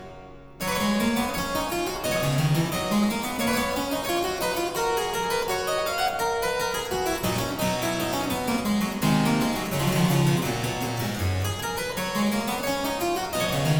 Aria Variations in G Major, Harpsichord

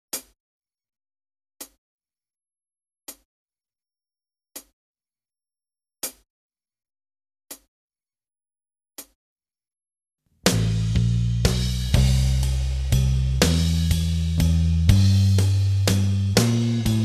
Solo Section [backing Track]

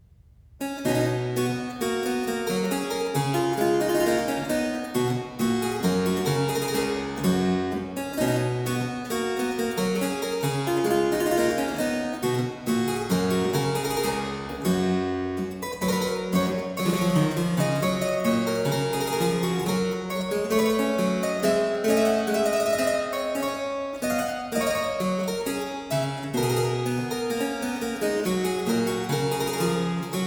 A new Schotch tune, harpsichord; Complete Chamber Music; Brilliant Classics; Miscellaneous pieces for the harpsichord

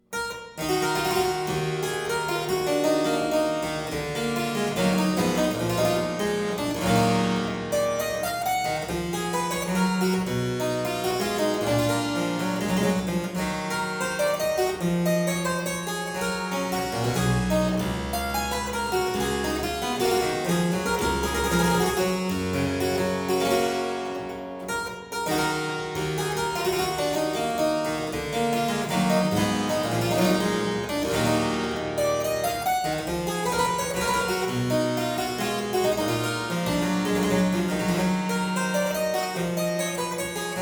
Suite in E minor Courante, Harpsichord; Buxtehude; Complete Harpsichord Music

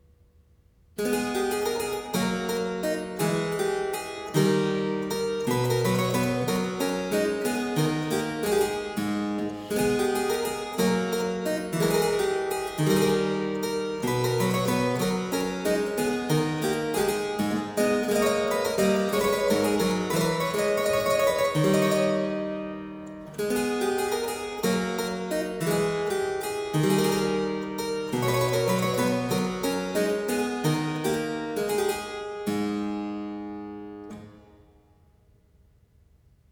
Minuet, harpsichord; Complete Chamber Music; Brilliant Classics; Miscellaneous pieces for the harpsichord

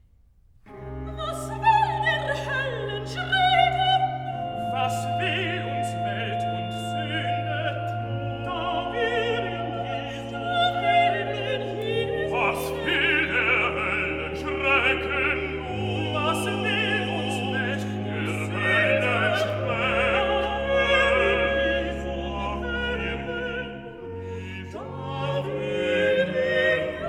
Recitative (soprano, alto, tenor, bass); Was will der Hoellen Schrecken nun

